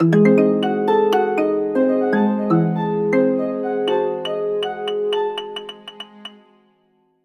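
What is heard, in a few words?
Jingle Cave; Die Drei Fragezeichen Kids Jagd auf das Phantom; 3DS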